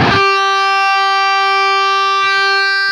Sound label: LEAD G 3, Guitar; East West; Steve Stevens Guitar Samples Collection